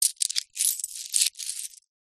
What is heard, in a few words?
foley money coins handful handling movement single hand; zapsplat